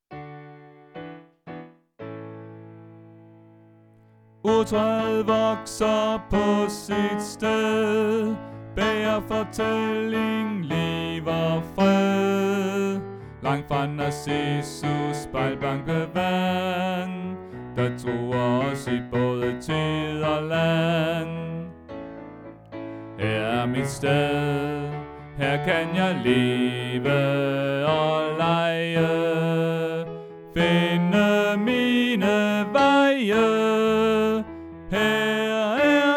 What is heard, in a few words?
Bas